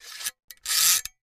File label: Caulking gun being loaded and unloaded. Tools, Hand Clicking; Woodworking Carpentry, Pumping